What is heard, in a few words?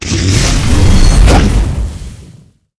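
wind draco attack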